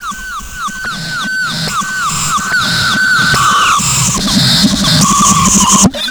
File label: CYBER REV, REVERSE; Big Beat Samples & More; AKAI